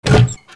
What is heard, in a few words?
DOORS